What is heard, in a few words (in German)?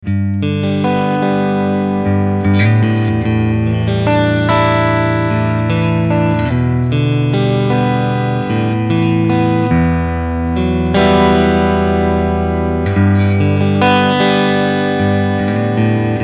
Cleansound Front und Middle